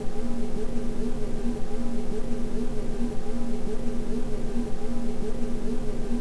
idle loop, thunder pistol; cso

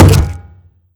metal hit, impact metal

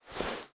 clothes generic foley

